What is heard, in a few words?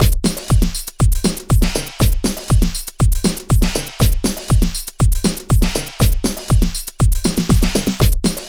TECHNO; INIS; Funky Xtreams